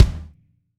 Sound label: Kick